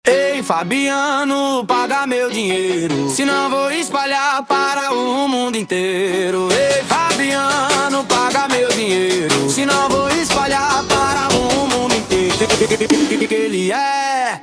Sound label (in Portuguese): versao piseiro remix